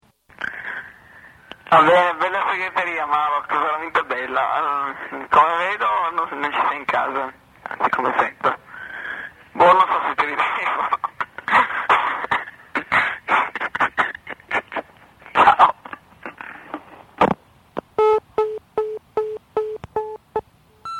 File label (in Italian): mandingo ahaha